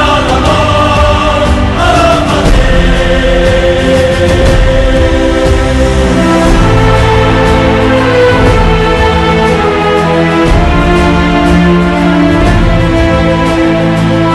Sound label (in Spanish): himno Real Madrid